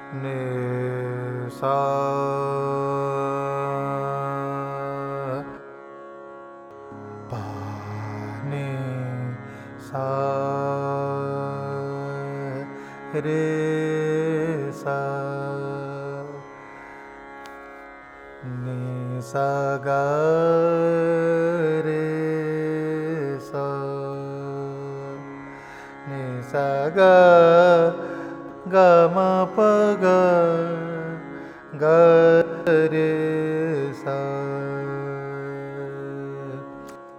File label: sur vistar, dhanasri